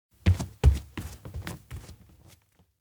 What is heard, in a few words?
step leave; anemoi